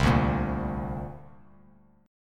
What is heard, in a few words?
C 7th Suspended 2nd; C7sus2 strummed; JGuitar